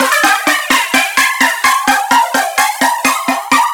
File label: synth 128 Cm; Electro; Anthemic; synth loops